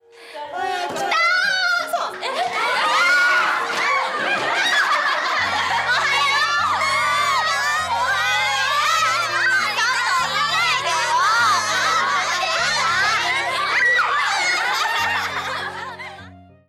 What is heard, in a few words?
RINGTONE